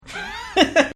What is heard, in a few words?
Female Laughter; Voice